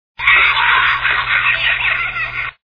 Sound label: laughter; mars attacks